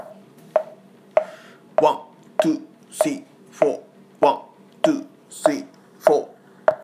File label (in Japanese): 表クリック